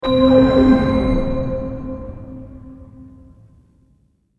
Deep Ping; Double Dip Lifeline Animate; Millionaire Soundtrack

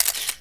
photo shutter; i OS

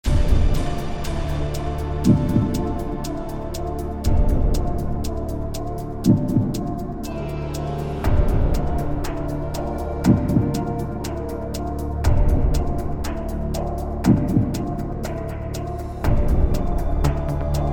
Clock; Millionaire Soundtrack; US Clock (2008)

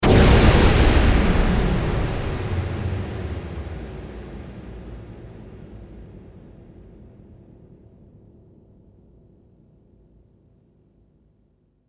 Tam Tam; US Open; Millionaire Soundtrack; US Soundtrack (1999 - 2008)

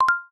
new window; VoiceView

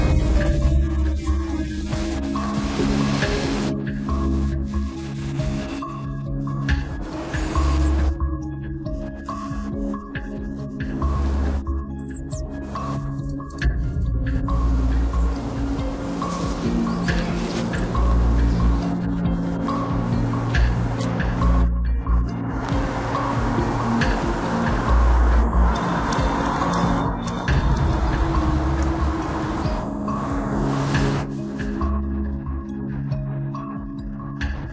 Suspicious Music

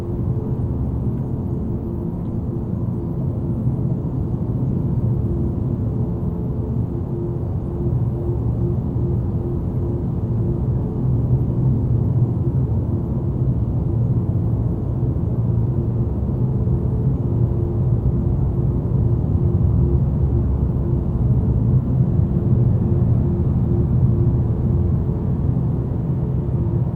engine room, ambient audio; Xbox

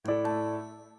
dc connect; braillesense

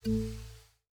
Windows Balloon

